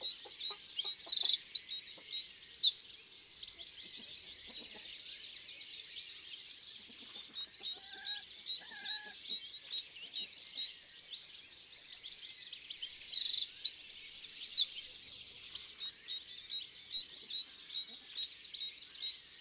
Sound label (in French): Troglodyte mignon, Chants d oiseaux dans la campagne